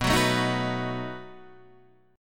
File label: B 7th B down Guitar Half Step Down